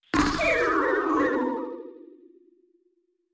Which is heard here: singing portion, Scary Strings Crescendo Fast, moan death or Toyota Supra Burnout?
moan death